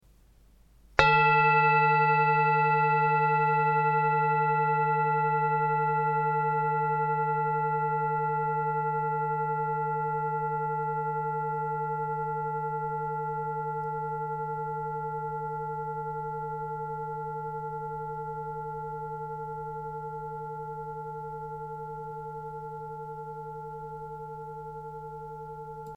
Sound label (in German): Klangschale